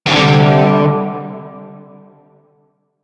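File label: Shawzin Pentatonic Major Chord L; Music; Warframe; Narmer Shawzin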